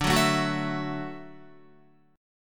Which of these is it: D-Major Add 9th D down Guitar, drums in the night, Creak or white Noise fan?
D-Major Add 9th D down Guitar